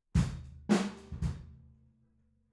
Room

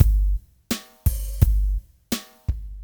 Loop, hammond riff

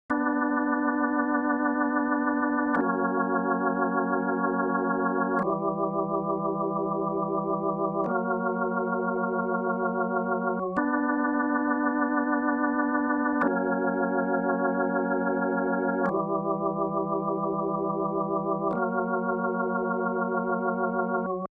ORGAN, Hammond

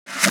VEE Clap

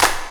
VEE Clap

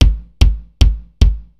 4 Kicks

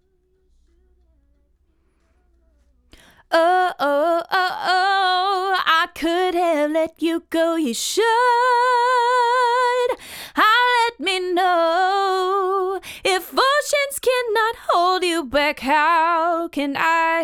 Vox

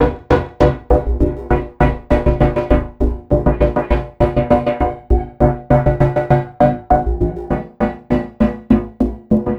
Envelope Beat

